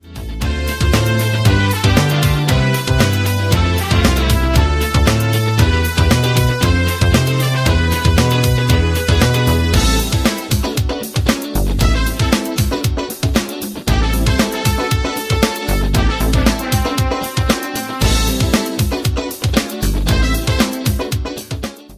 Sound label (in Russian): Muzika dozhdia